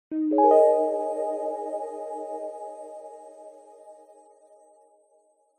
multimedia game sound zen game soft warm relaxing positive tone